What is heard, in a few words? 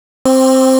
VOCAL 130BPM delay; SONIC ELEMNT; SONIC EL YES